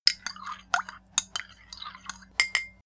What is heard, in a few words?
spoon cup stir